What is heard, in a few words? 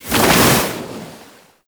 Grounded Staff End; Vazarin; Focus; Ultimate Abilities